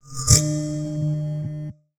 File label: tech expert reprogram mech; war ui class specific; esmteam